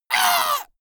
monster hit high; schreie

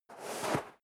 step sneaker sand scuff; schritte

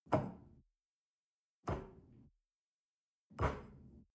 shell grab from table short; berufe; gastronomie; soundpool

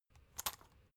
salt mill metallic soft; berufe; gastronomie

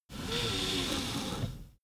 car seatbelt release slow

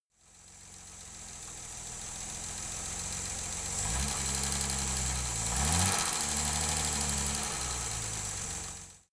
car jaguar e-type rev soft engine

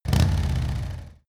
motorcycle harley engine low rev short

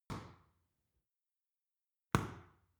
boxing paw hit soft; boxen

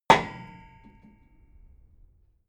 metal impact girder

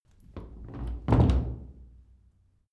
old door close

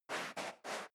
step leather shoes sand scuff; schritte

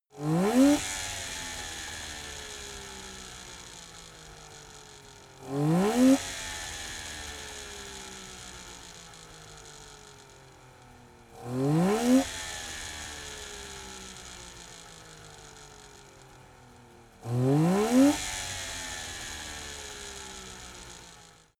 car saab 92 rev slow engine

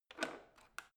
washing machine creaky rotary button; haus garten; haushalt

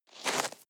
step snow walk single soft; natur-tiere; schnee

